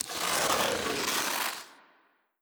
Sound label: zombie scratch, brickwall